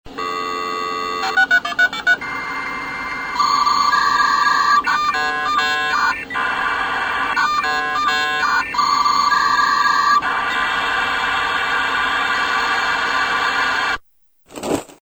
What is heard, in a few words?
modem